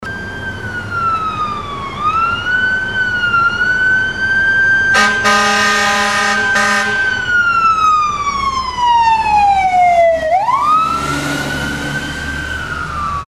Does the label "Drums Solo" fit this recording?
No